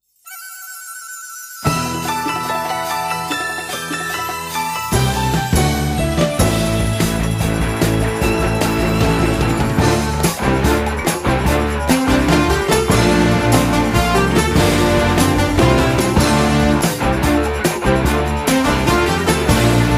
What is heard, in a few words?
Chinese bamboo